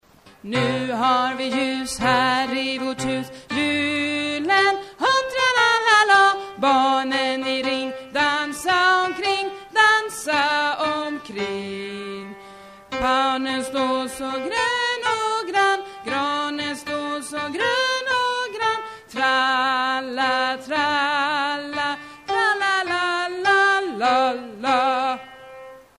nu har vi ljus bas, stammor

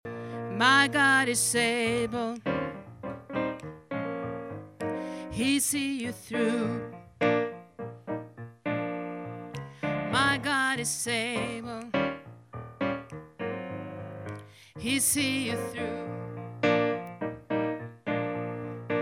bas, stammor

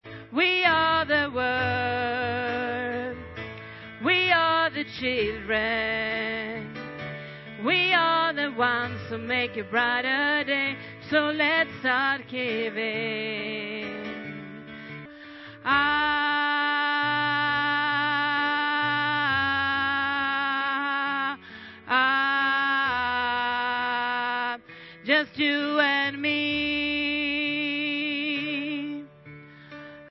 alt, stammor

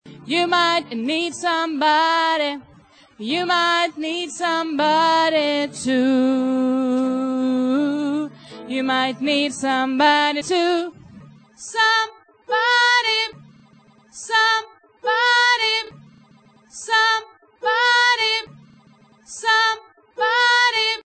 alt, stammor

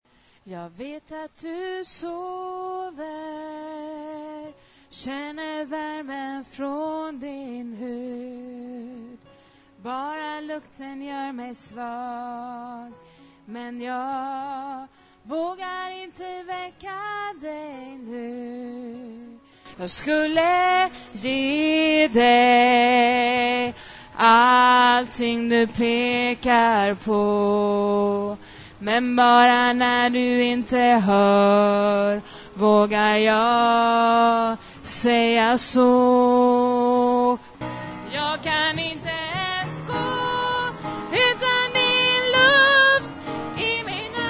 bas

